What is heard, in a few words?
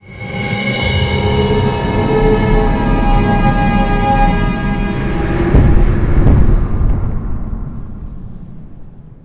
grind; spawngirlsounds